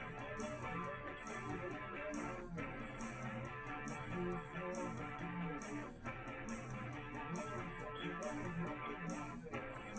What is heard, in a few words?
drums liverec 250ms 5cm; SiSEC10; underdetermined